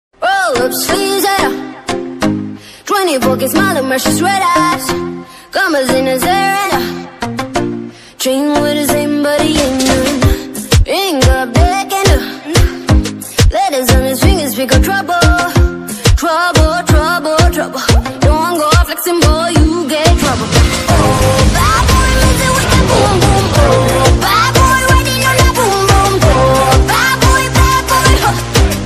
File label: bgm english ringtone